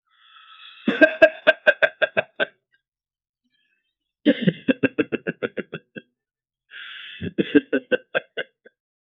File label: cleared his throat; speech